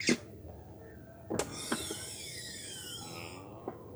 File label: Lamborghini Door Open, Automobiles